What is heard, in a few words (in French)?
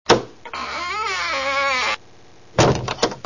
texture ouverture porte loquet et grincement